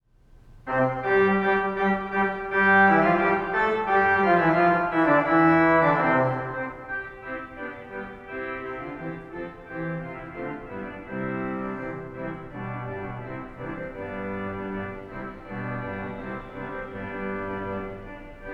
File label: Marcello Organ